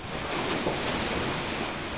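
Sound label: ambient cave; ABUSE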